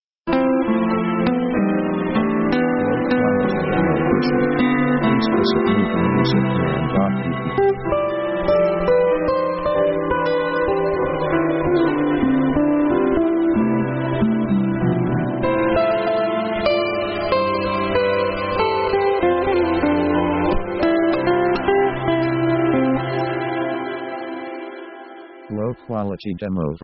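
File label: pop dreamy acoustic guitar; contemplative themes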